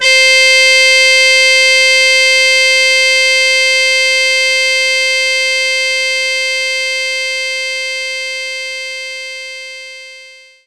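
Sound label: mute trumpet C5; knights try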